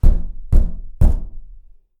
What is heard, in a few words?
hit house wall; knocking